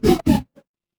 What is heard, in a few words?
retro game enemy death